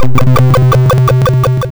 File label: portal